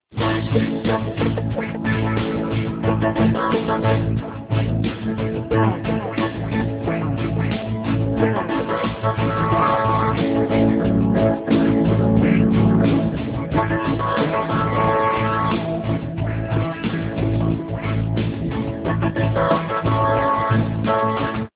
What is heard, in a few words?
reggae music